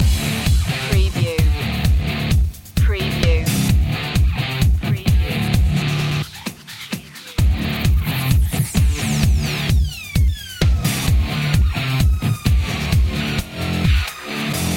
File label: 80s revenge loop